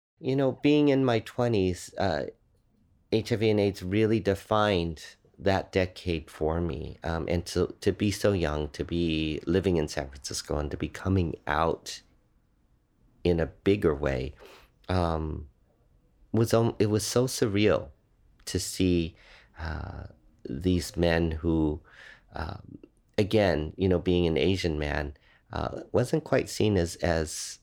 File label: Opening Hymn Intro Singing; MCCSF